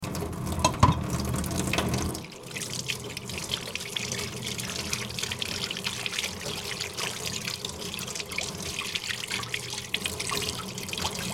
washing dishes

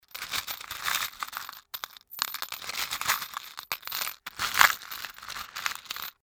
tool box

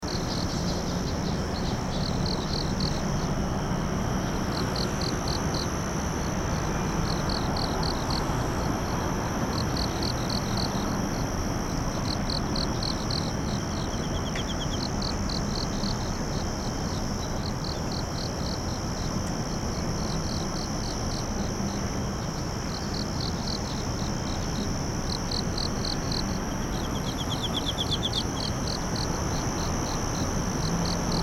singing of insect

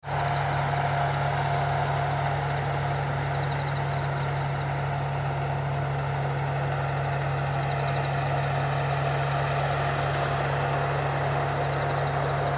rice field

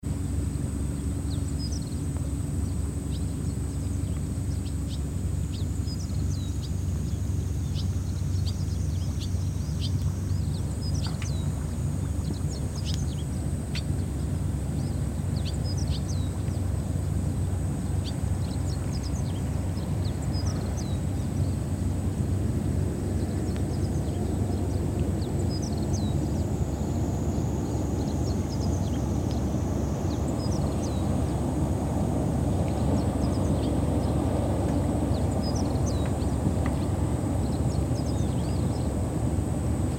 muddy flat

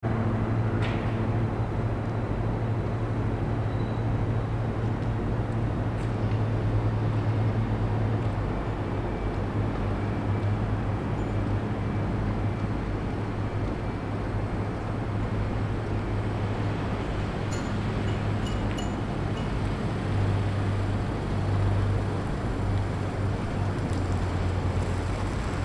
harbor

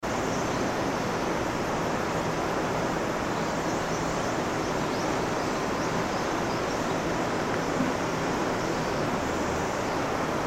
river

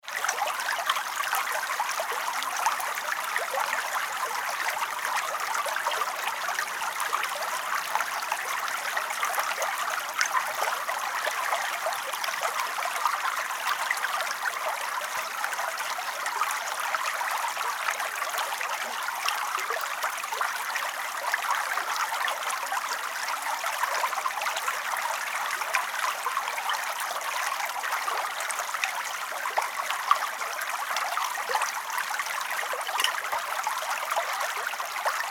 water

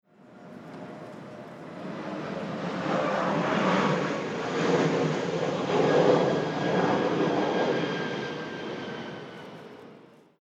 jet

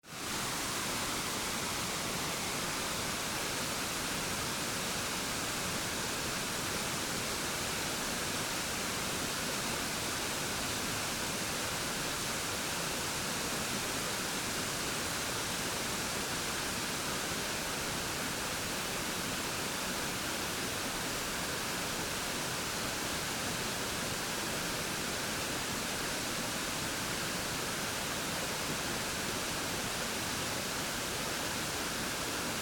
waterfall

